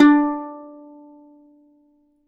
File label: plucked Lyre D4; Polyend; Simulacrum Sounds; 1 Shots